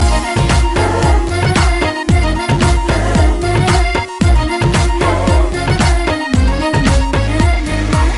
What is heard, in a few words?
Dance Tune